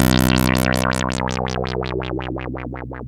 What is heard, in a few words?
2600 B1, ARP 2600; Hollywood Edge - Giorgio Moroder Rare Synthesizer Collection